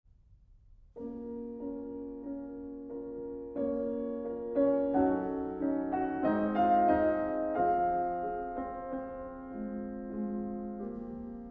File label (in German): Etuede b moll